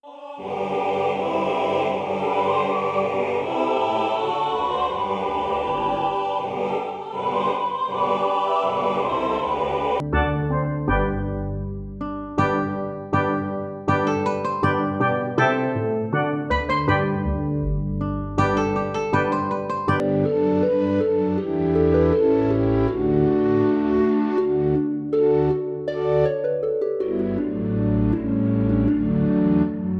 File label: Mix, backing music